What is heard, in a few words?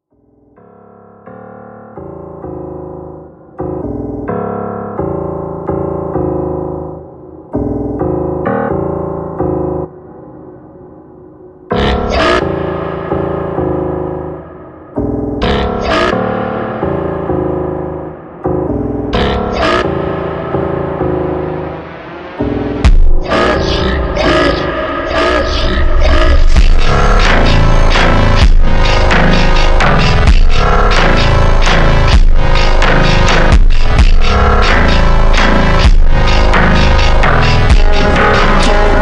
Phonk Music; فانک phonk